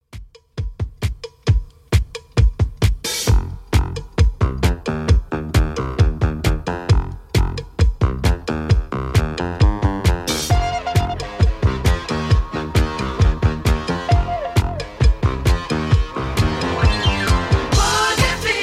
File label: 70s Redrum 133bpm